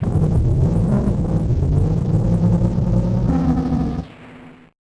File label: Malfo Syn, malfunctioning synth